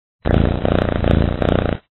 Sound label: purr